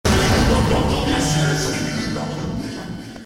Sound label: toilet; mini balaclava